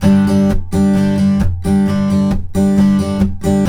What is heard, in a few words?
Blues Guitar Samples, RIFF; ILIO; Fretworks